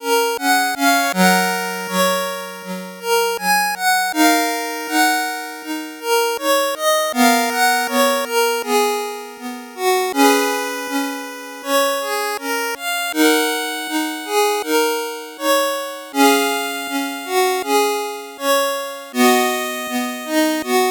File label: 8bit bgm normal